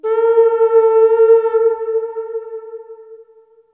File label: AF HRN A#4, AMB HRNFX